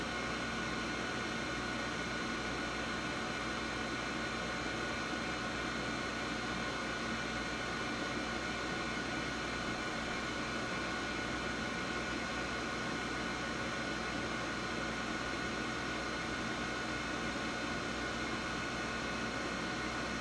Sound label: Computer Sounds; RadwinIsland